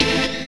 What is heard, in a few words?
SYN, DANCE SYNTH